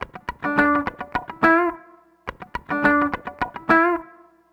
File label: Garage Funk Guitar, 70s Analog Funk; Sonic Foundry (Sony Creative Software); What it Is!